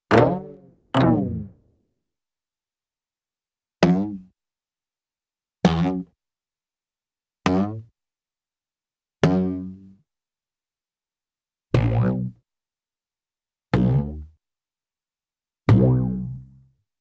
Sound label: Short String Plucks, Cartoon; Hollywood Edge; Cartoon Trax